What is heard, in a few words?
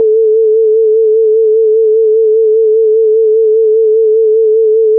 vibrato